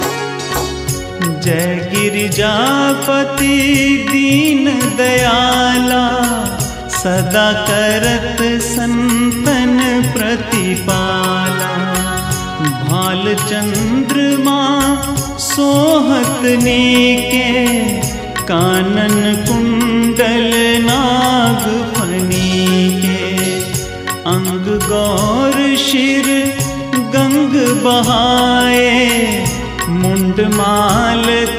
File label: shiv chalisa ringtone